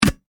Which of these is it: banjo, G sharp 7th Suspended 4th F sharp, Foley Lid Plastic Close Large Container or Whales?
Foley Lid Plastic Close Large Container